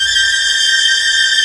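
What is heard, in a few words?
MAJOR TRILL G3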